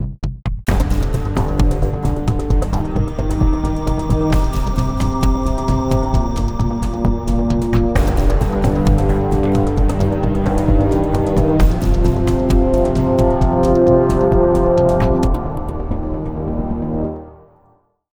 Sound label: Ticket Seaside Startup; Global Agenda